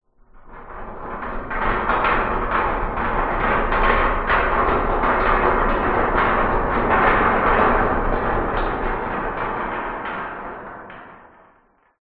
foley metal large heavy movement resonates reverberant; zapsplat